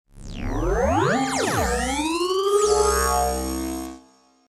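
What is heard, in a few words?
poyavlen gologrammy, hologram appearance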